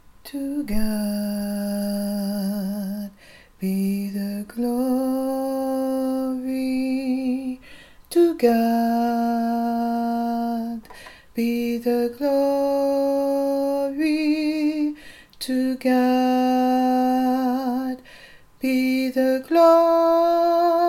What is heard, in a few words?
BASS